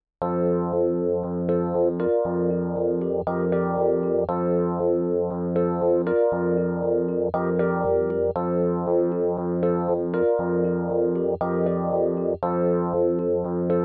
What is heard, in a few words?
not reamped